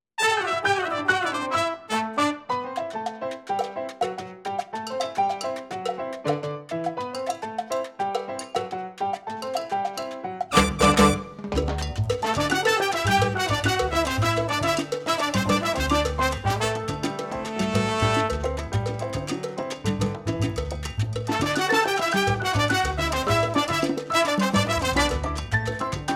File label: Latin Theme